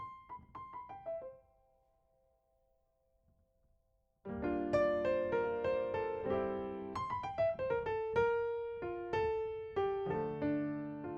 Piano A

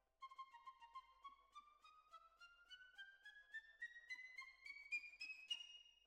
Oboe

